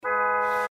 hupe

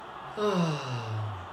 stoehnen

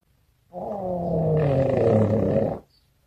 flatterfurz

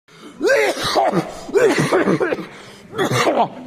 husten

